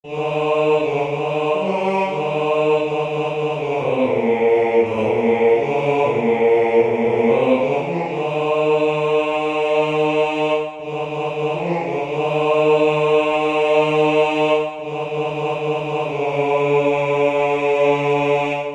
Pottoka Mendian basse

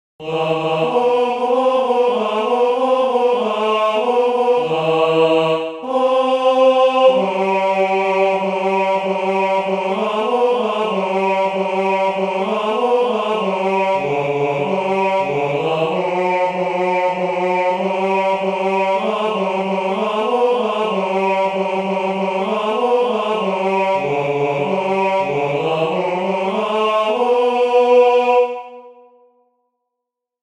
basse; Kalinka

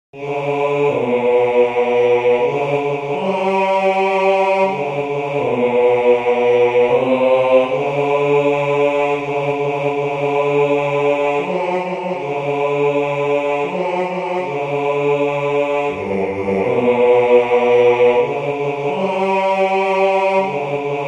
basse, choeur

